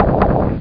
clear 2 rows; Tetris Max 2.6

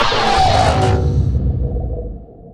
soul pain; darwinia

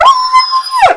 dog scared

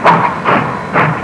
train chug; Sound Library 2000 - Wayzata Technology; Transportation

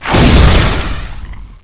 FIREBALL; Hexen II; eidolon

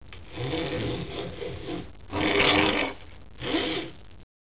pig

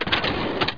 open

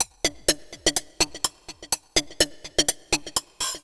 XTRA HOUSE 125

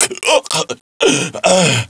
choke; SINDEMO; player; blade

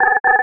Beep; Earth and Beyond; EA Games

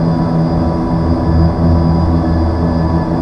engine; imp

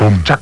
boomcha

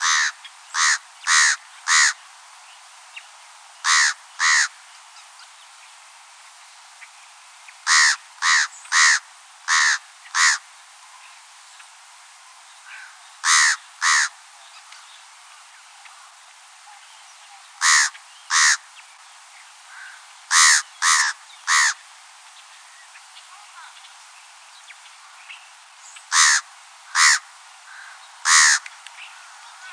FISH CROW; Explore Coastal Louisiana with Boudreaux; Birds

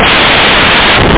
clap

